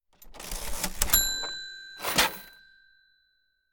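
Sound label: Open Close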